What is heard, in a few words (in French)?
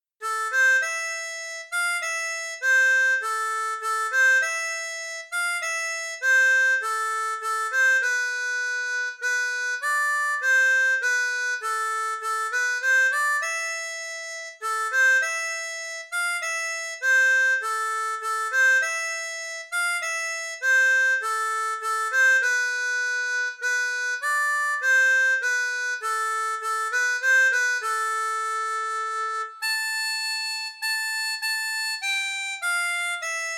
Valse finlandaise, harmonica; Folkloriques